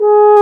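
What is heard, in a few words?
brass, F.Horn Solo; Roland L-CD702; F.Horn 4 Sh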